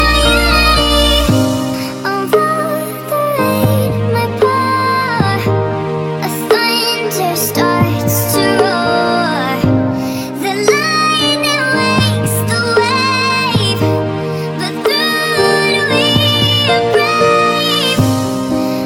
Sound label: acoustic ringtone